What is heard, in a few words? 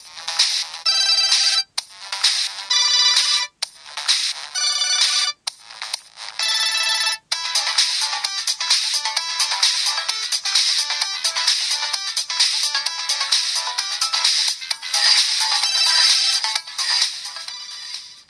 dubstepy ringtone on my old samsung phone